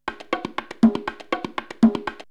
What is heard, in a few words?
Drumloop; ZERO G - Datafile DISC 1